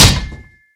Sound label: metal, mob zombie; ayuncraft-1.5